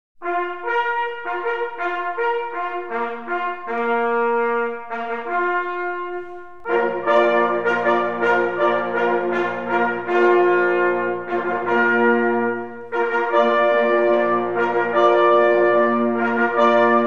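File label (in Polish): Hejnal, rogi mysliwskie; L. Adamik; Hejnal Klubu Sygnalistow Mysliwskich PZL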